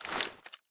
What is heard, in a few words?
spread deselect; spreadgun